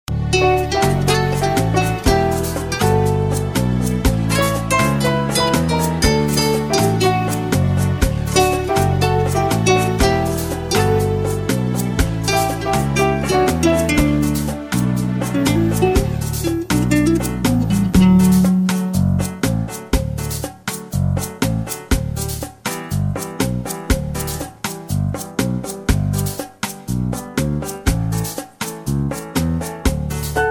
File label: D No Backing Vocals, backing tracks